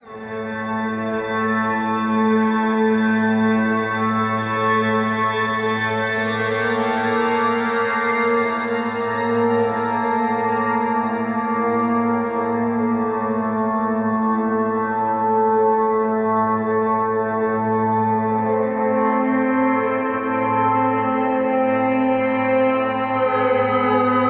Gloam Drone - A Phrygian 79 bpm, Atmospheric; MIDI Clips; Tonal; Ableton; Factory Packs; Mood Reel

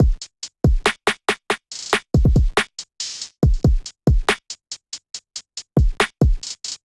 Kit 70 bpm, trap drums; MPE Flux Kit; Trap Drums by Sound Oracle; Ableton; Factory Packs

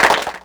Clapbox Pitch, Clap Box; Ableton Foundation FreePacks

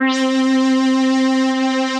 Synth Lead; Other Worlds

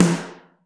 Mono, Snare; Ableton Foundation; Snare Designer

